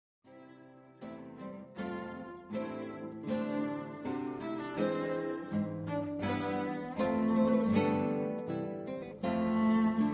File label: Hymn